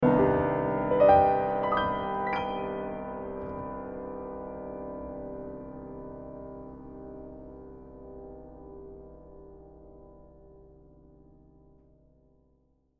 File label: piano